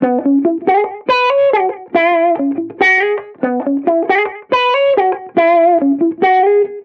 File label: Reggae Guitar Loop 140 BPM E Maj; Vibrations; Cymatics; Vibrations Guitar Sample Pack